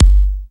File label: ZAP KICK, Techno Trance; E-MU Formula 4000 Series Vol. 2; OrbitPercMaster